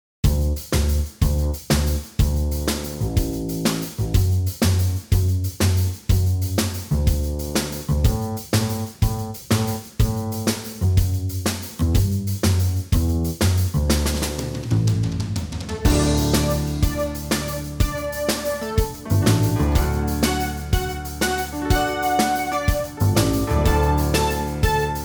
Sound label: karaoke